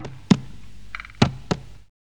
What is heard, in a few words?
ETHNO; E-Lab; X Static Goldmine 1